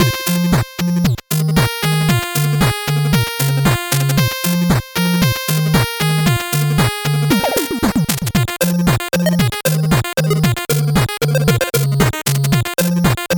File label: 8bit bgm slow